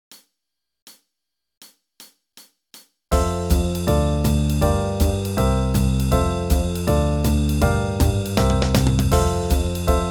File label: Backing Track F major Original Key 160 bpm